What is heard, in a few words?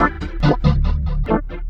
Multifunk, Funk Keys; Sonic Foundry (Sony Creative Software); Synchro Funk